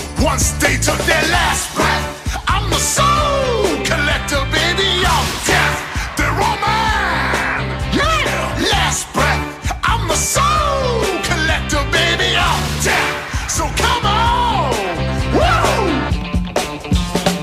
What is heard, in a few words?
last breath